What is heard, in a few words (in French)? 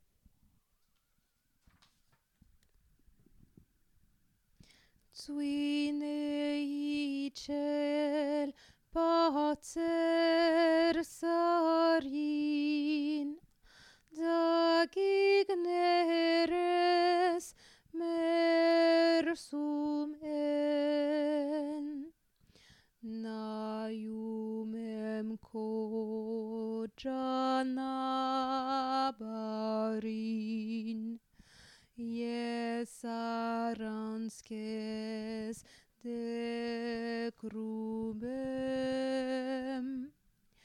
alto; gueroug ner